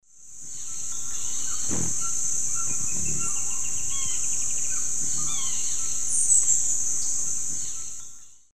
Heliodoxa aurescens, hum and flight call; S. America; Eastern Lowlands

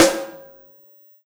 DRUM; DRUM SUGAR

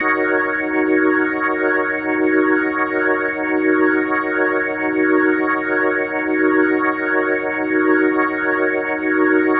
Organ D# Min7; The Vitale Organ; Minor 7

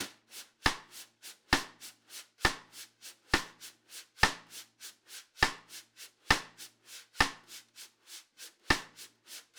Drums, Jazz

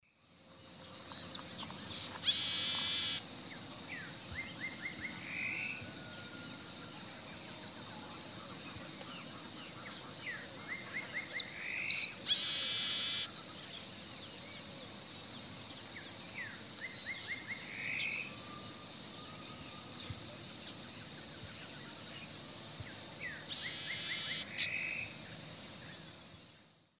Sturnella militaris, song and flight song; S. America; Eastern Lowlands

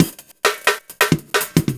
Drum, Breakbeat

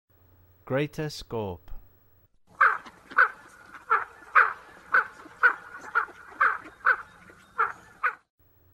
Greater Scaup, Songs of Birds; North America; Western USA; Western USA Songbirds

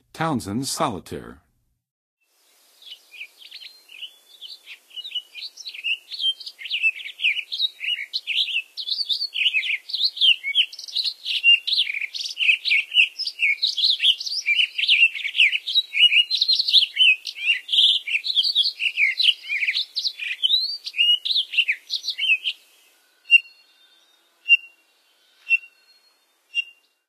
Townsends Solitaire, Songs of Birds; North America; Western USA; Western USA Songbirds

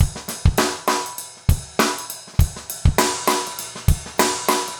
basic funk 100k

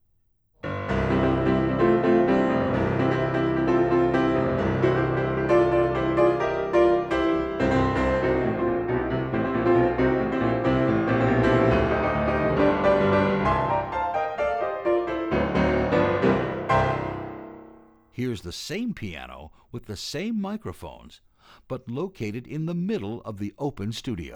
Piano Against the Wall of the Studio

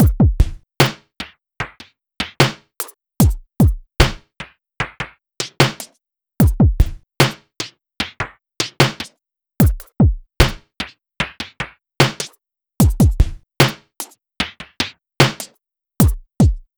Drums, Rock

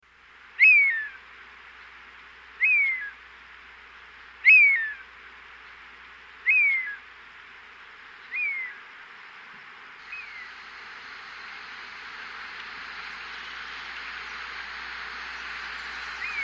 Black-Chested Hawk; S. America

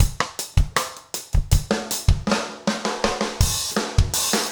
trip hop rims

